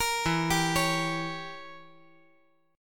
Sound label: E Diminished 7th E